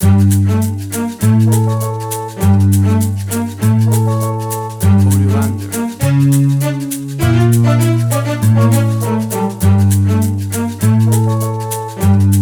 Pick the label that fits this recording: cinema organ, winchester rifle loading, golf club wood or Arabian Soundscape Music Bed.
Arabian Soundscape Music Bed